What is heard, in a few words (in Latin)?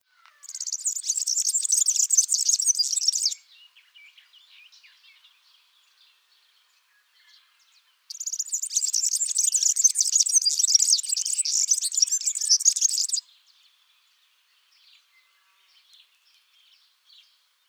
Serinus serinus